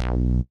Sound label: B2 bass